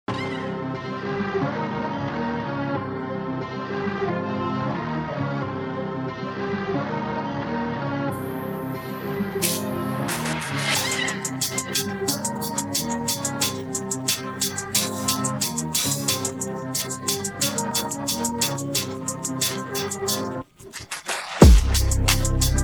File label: instrumental; persian